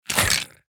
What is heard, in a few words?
monster skeleton attack anticipation